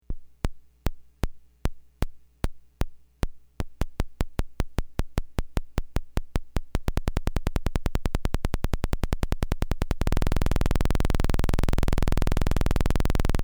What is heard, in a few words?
clicks